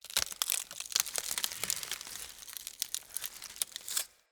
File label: Under City Tree Root Bark Crackle Slow; Ambience